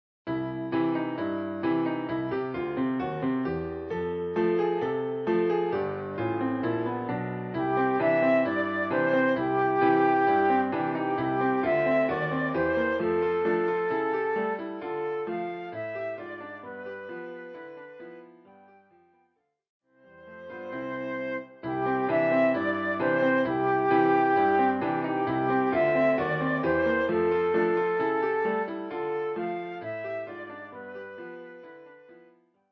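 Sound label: flute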